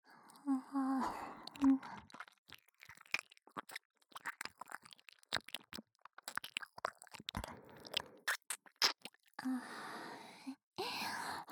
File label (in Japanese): 左耳舐め; アドリブ集